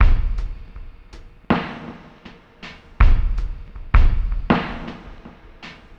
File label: DRUM LOOP ambient 160